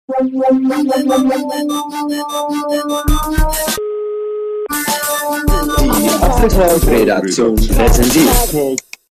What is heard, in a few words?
Jingle, radio; MetaSinus com